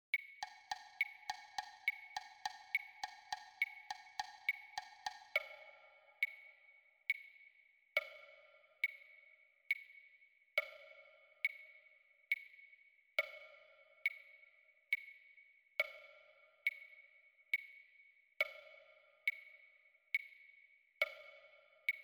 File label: Pulse Click